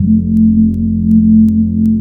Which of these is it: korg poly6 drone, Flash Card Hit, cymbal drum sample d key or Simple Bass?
korg poly6 drone